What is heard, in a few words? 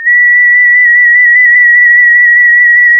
pop, sound of a tea kettle whistling